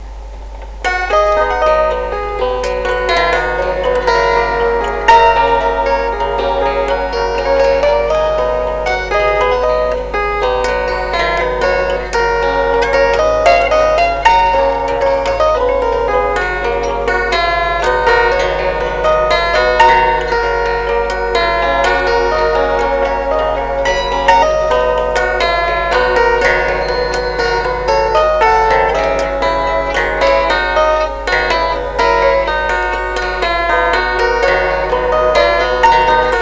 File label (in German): Chordephon